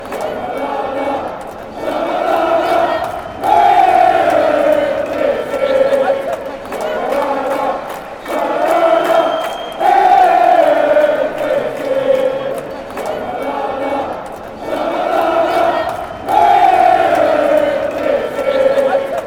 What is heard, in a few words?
shalalala shalalala fan chants